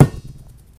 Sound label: object balloon; lucius